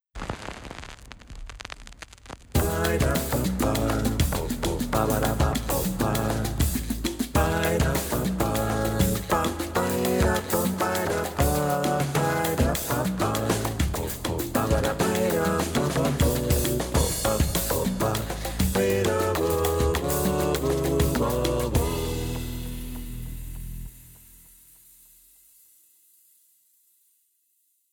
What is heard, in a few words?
jingle, percussion; podapopa; citypercussion